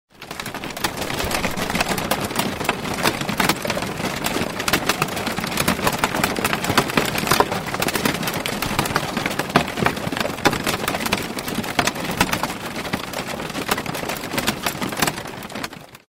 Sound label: Storm